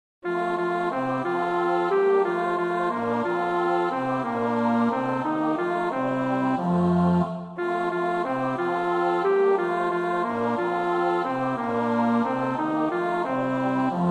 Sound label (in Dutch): bas, koorpartij oefening; Waelrant (Hubert)